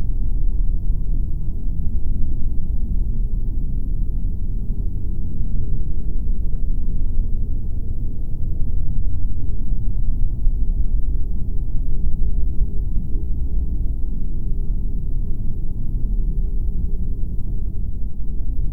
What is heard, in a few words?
ambience; Harvest Massive Encounter